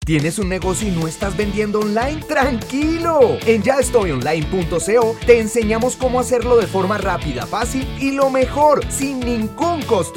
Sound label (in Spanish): Musica; CCCE Yaestoyonline